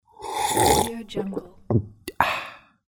drink

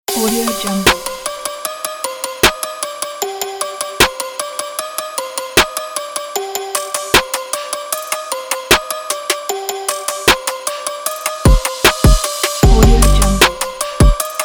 bouncy hiphop; presence illusion

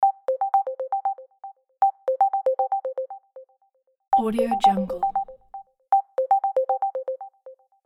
incoming video call ringtone

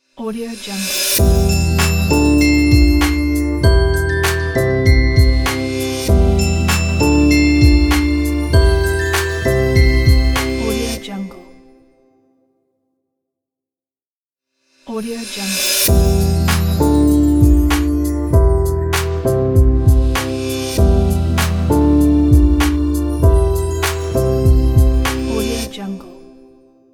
chill corporate logo

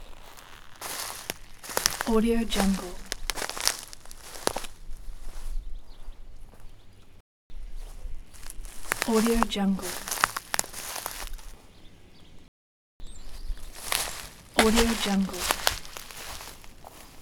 steps in the forest

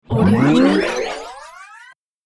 hyperspace spell

